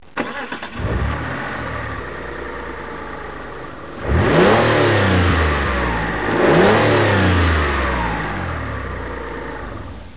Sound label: cars